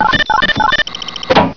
scanner; svencoop; echoes

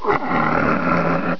headcrab die; svencoop

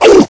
pain; svencoop; scshogo; fallenstrooper

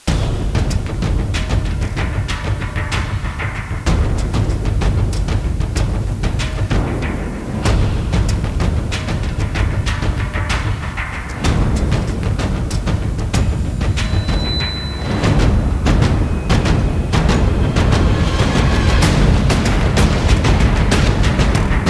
Armored Cars; svencoop

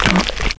bite, pupae; svencoop

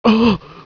death; svencoop; Roy

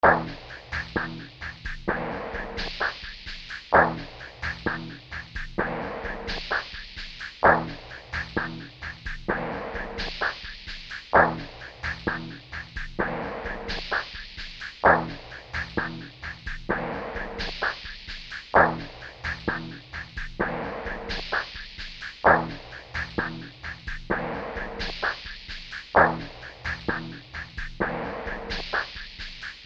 music; svencoop; sence; mistake coop

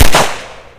pistol shoot; svencoop; hl2

